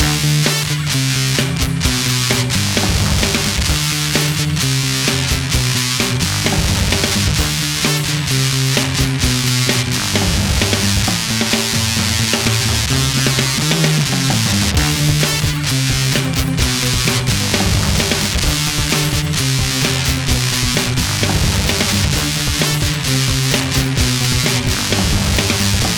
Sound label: Dirty Punk Loop